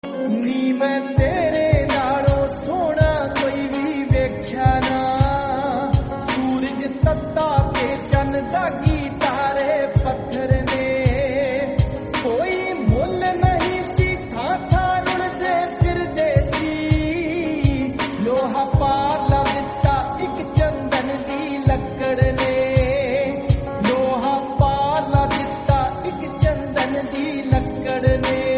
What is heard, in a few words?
Punjabi Song